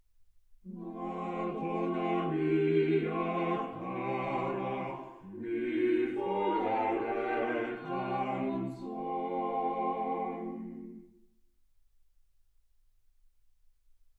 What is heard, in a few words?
quartet